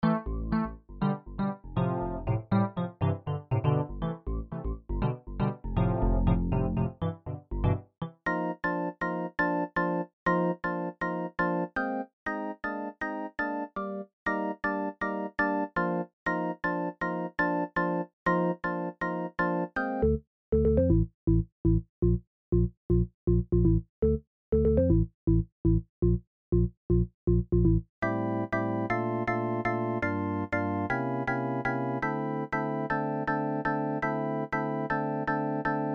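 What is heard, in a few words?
Korg M1 Classic Riff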